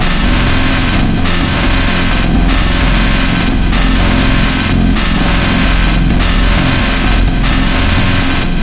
Alert; Vectron; Steamworld Dig 2

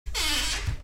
Open Door; through abandoned the underground city 2015 gamerip pc macos

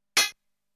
Hoe Hit; Namco Game Sound Express Vol.1; Valkyrie no Densetsu

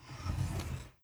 dialogue window slide up

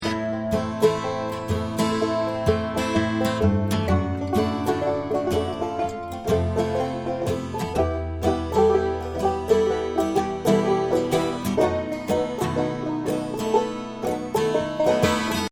Sadtown Tutti; Outdoors Old

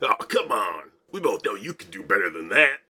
taunt; En punkcroc

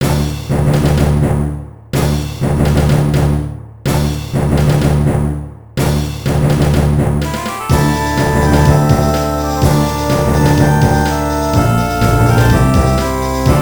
Thunder Explosion; Solatorobo: Red the Hunter

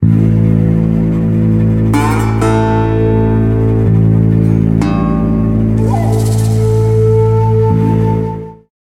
Westernshort

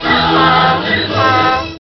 Concrete Donkey; worms 2

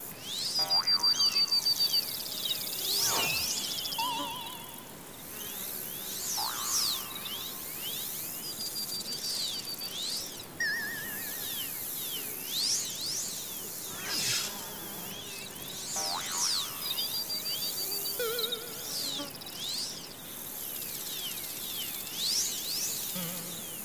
Ambient The Pirate Stronghold; rayman arena

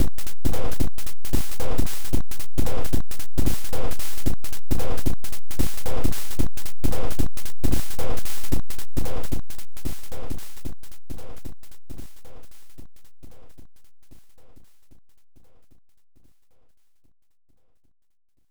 Radio Message, Continue; jurassic park nes

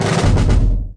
Begin Combat; Civilization Revolution

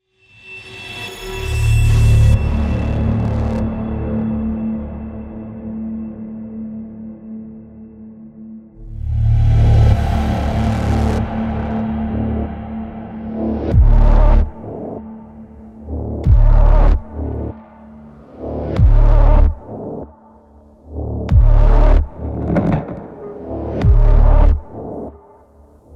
Space Main Ambient; Mars Core

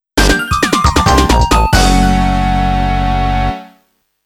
Kaishi Fanfaare; psikyo arcade sound digital collection vol. 08; Supebon GO

